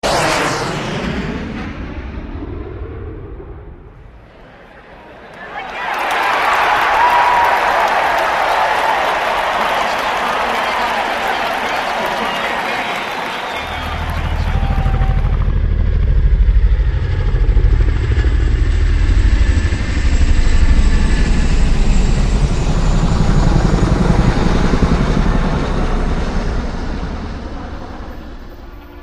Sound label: 3d Helicopter